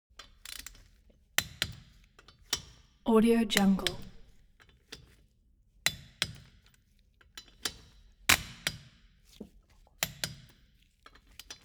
construction garage wheel change sequence screw and hammer